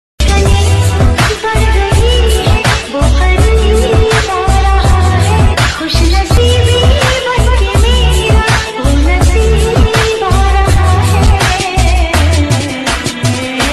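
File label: hindi love background music, hindi song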